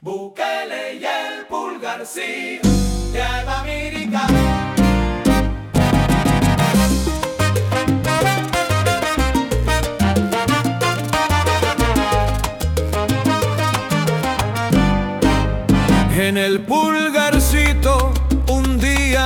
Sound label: SALSA